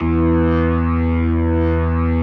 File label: E2S, B3, Vintage Keyboards